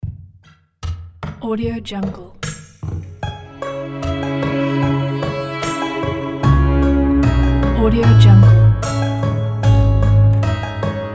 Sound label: plucky americana acoustic show opener